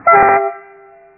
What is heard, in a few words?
klaxon, Train; M41 Bhv